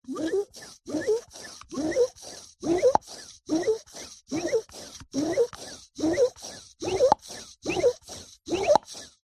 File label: household balloon pump pumping action handle pov